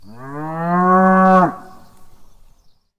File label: amb cow; tourismustal